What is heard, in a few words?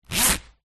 foley rain jacket zipper; zapsplat